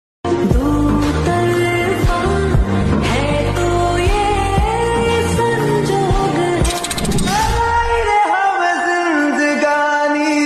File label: new ringtone ringtone hindi ringtone caller tune romantic ringtone ringtone song